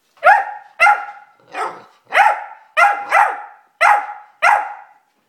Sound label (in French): Le chiot aboie